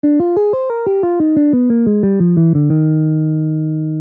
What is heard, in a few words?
minor 2 5 1